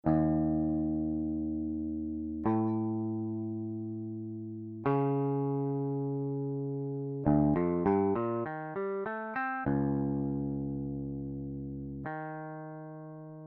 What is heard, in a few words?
T2 LPC clean